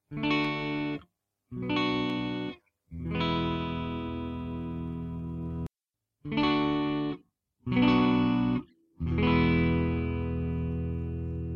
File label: chords clean neck